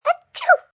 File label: Sneeze Kid Cute, Sneeze, baby, short, cute, cold, sick, (human voice); Sneezing; VoiceBox